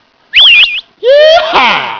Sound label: yeehaw